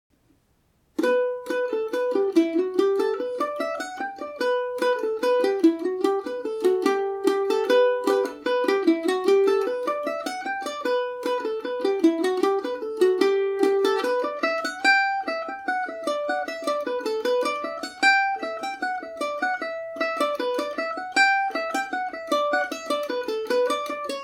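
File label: E dorian paris swing, irish mandolin; morning star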